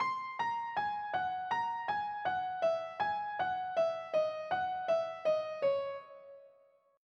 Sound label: altered scale